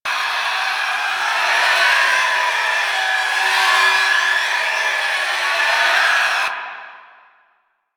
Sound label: Weave Boss Spirit One Loop; Warframe; Cephalon Weave